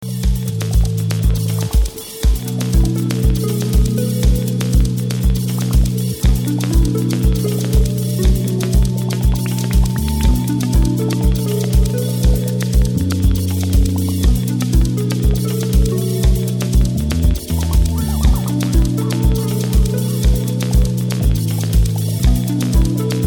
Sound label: Dream Drum